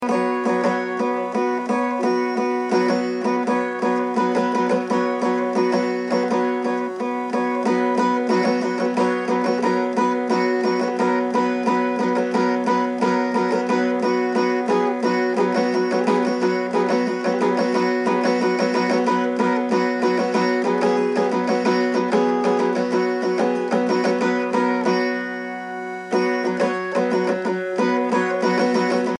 country dulcimer

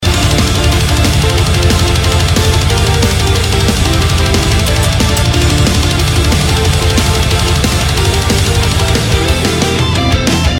Guitar Riff

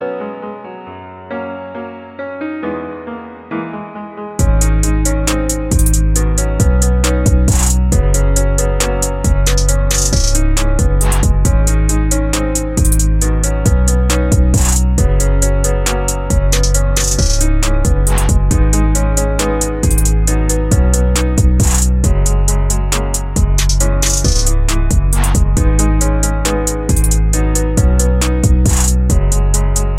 Piano

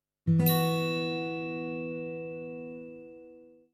Sms Tone

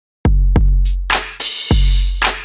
Drum Beat

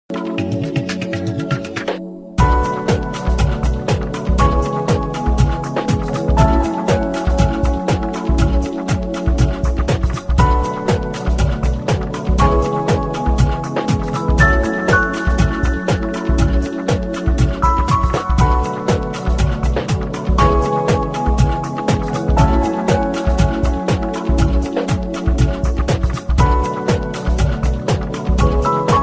Nokia 8800 Sirocco

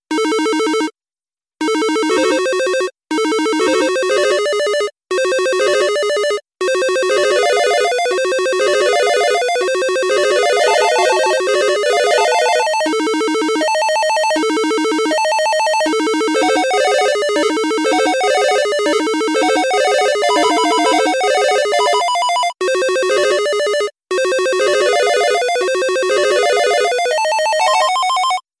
Busy Office

